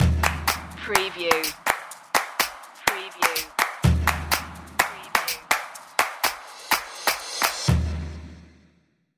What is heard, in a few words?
exciting drums intro